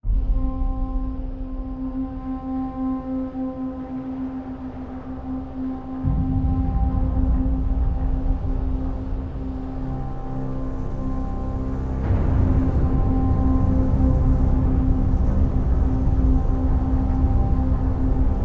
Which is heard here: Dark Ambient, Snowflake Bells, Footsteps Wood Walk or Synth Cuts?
Dark Ambient